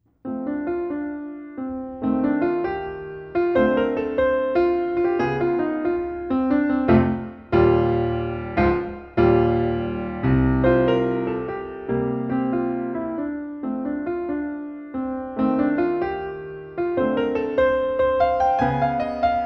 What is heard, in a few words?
Pop Jazz Fantasy